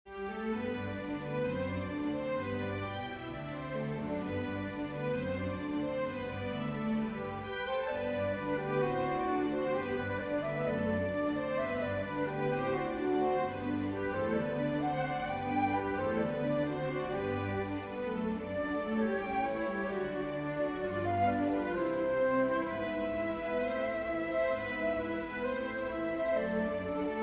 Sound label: for flute strings; Schubert, Franz Peter; danksagung an den bach